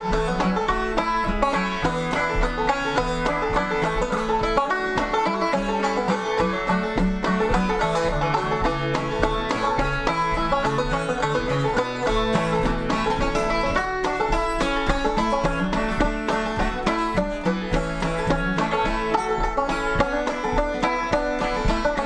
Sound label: musicbox